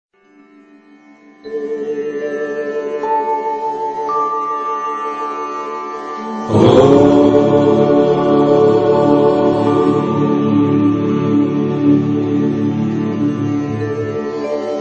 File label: om devotional